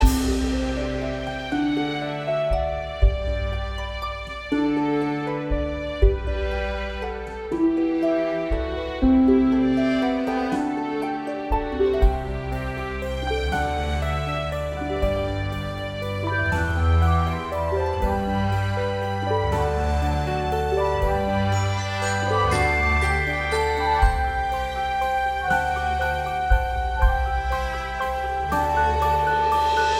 love birds

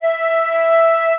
flute

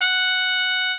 mexican trumpet

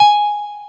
piano

